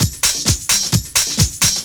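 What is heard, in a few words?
drum loop 130; House; Best Service ProSamples vol.10 - House; AKAI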